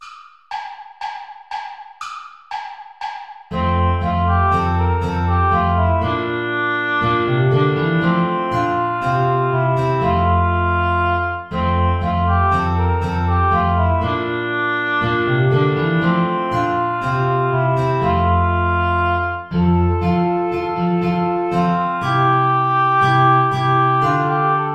mit lieb bin ich umfangen play along without guitar; steuerlein johann